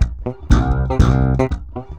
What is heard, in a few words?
C 120 Steppin Funky